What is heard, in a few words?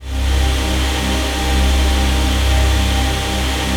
ATM TONAL; Best Service - XXL Production Effects